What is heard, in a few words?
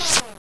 Switch Click